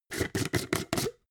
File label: jar glass plastic open fast